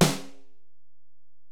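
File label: Acoustic Snare, Alesis DM-PRO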